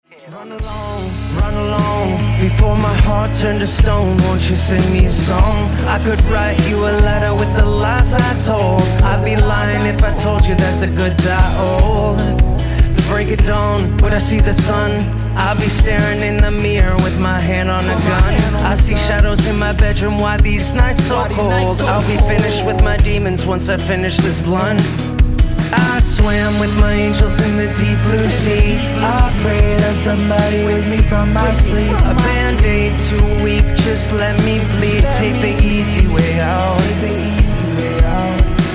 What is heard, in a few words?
ROCK POP HIP HOP